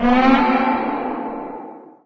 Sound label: bank alarm loop, alarms; ambient; Garry's Mod; hl2; combine